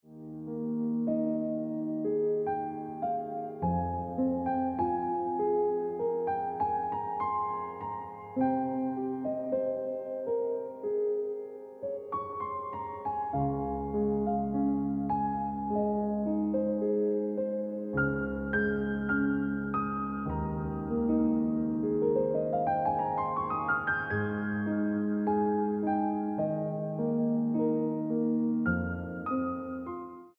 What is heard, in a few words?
Deep In Mind Ringtone Piano Music